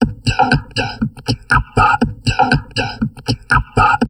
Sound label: TALK, Drum Loops Crazy Processed; USB Soundscan vol.07; AKAI